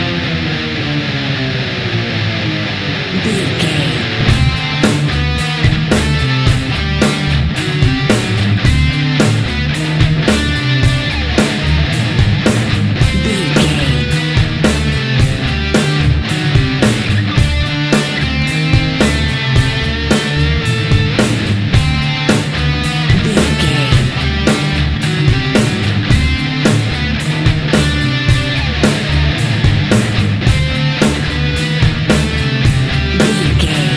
frontal attack

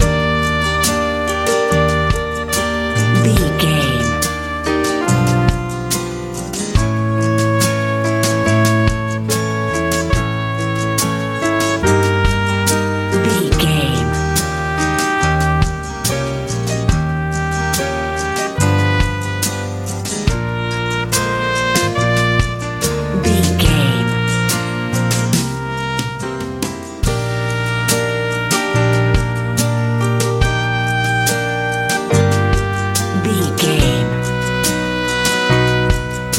bright nice easy pop

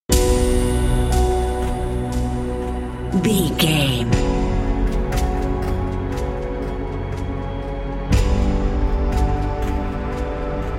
alone and sad bumper

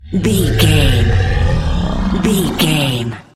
large monster roars

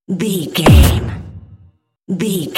dramatic hit deep electronic

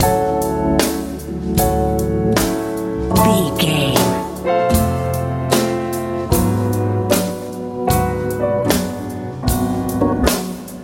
powerful nice echo head life jazz

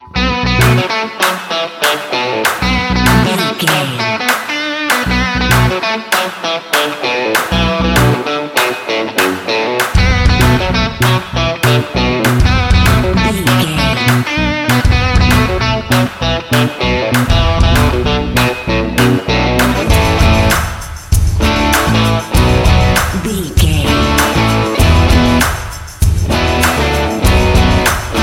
rock machine

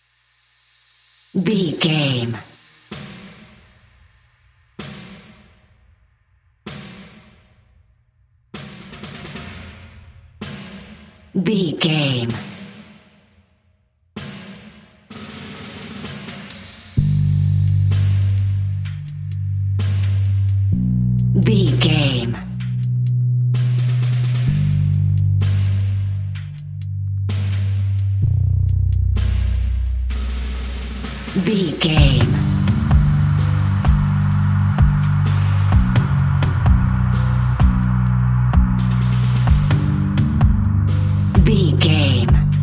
bass and drums

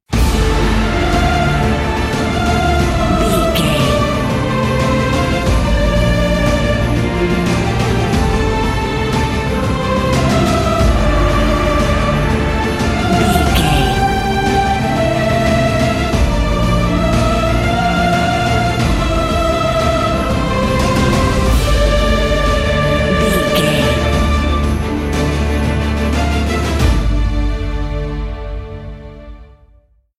epic cinematic

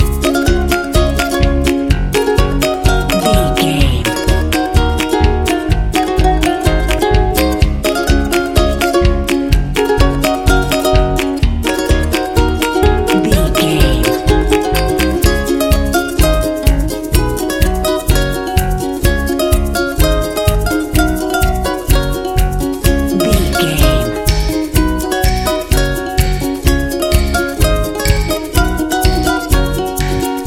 tropical fun loop

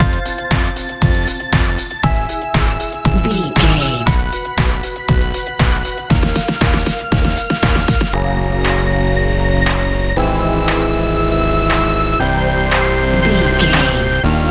dance rock

